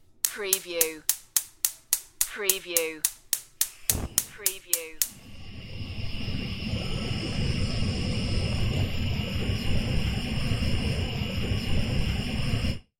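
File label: Light Burner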